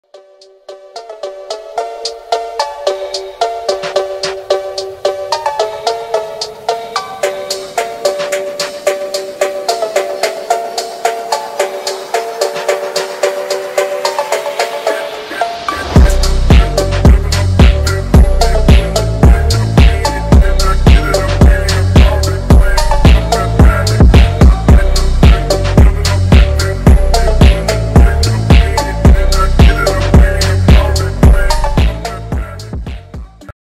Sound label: phonk; легендарная походка под фонк; vince mcmahon